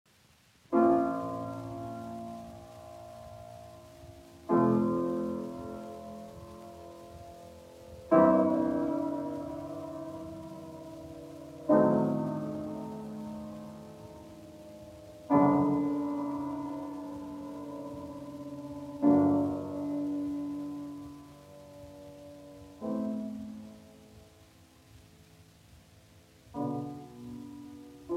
Piano Trio in E minor Largo; Piano Trio No. 2; Op. 67

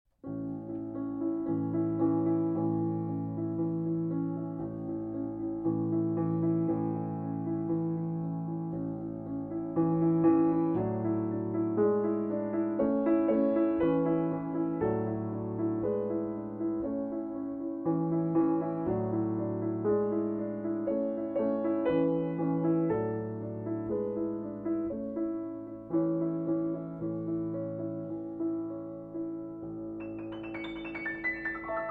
Berceuse Cradle Song

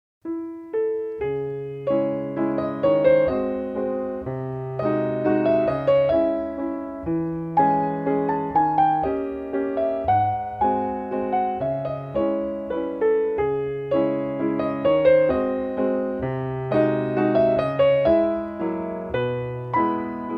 Mazurka in C sharp minor

